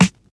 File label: short snare one shot f key; snare drum samples